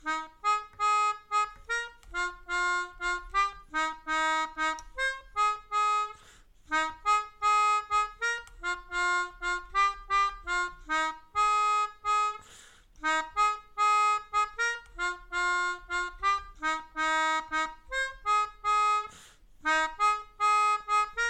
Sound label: Melodica